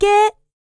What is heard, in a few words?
LICKS, Vocal R&B Dance; LT DANCE